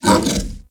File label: Kubrow Tame Interact Bark Speak